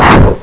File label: dogon